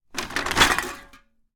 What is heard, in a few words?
Cans and Small Containers - Aluminum Cans, Rustle, Drop; Double Trouble Audio; Junk and Debris